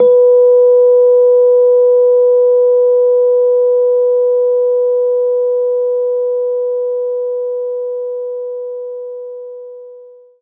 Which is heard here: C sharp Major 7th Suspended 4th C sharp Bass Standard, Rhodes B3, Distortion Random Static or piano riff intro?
Rhodes B3